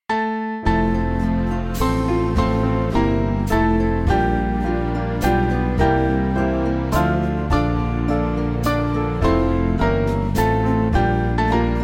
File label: Band, Music Backing Files for Churches; Captain Of Israel; Marienlyst